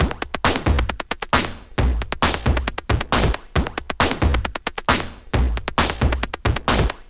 135 Pop Electro Beat, Pop Rock, Drums, Very Fast Tempo; Pro Tools Essential Loops; Digidesign